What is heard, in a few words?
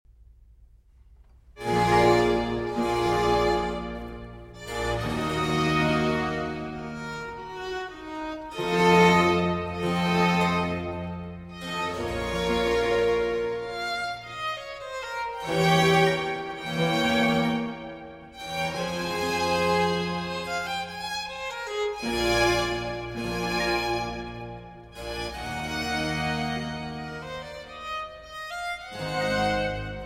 Concerto for Strings and Continuo in G Major